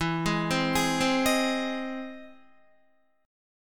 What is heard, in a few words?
E Augmented Major 7th, Guitar; 11,11,12,13,12,11; arpeggiated; 4F,4A,5C,5G,5C,6E